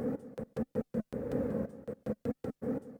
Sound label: Industrial 160